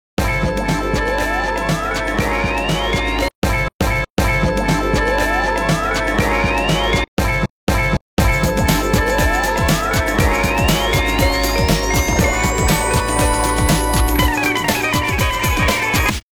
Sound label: Liftoff, ringtones